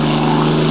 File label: Engine Spitfire